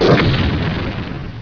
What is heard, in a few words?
boom; quakerepo fortress misc